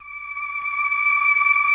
voxy wind; Greytsounds; F2-SYNCLAV 2

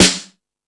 Dance, Drum Machines

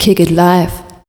PHRASE, Voice Spectral; Best Service; Voice Spectral II

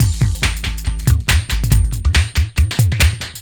Bouncer Beat, Drum N Bass; Sonic Foundry (Sony Creative Software); Knutrix Drum & Bass

